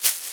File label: Caxici; EMU Orbit-3